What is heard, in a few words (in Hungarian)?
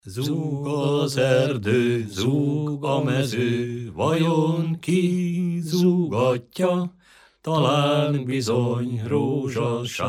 nepdal; zug az erdo; nepdalok